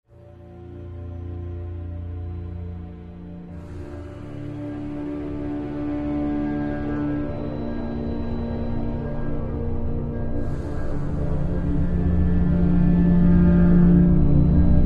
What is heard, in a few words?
Ambient Loop